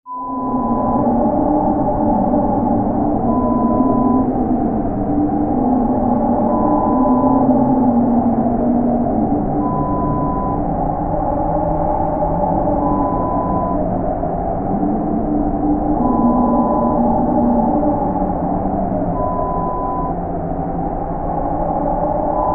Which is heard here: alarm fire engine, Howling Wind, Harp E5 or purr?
Howling Wind